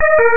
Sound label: lava